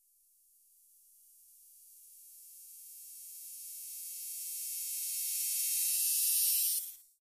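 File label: Electric Buzz Rising; buzz & hums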